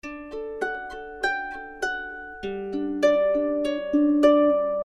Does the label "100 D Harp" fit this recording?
Yes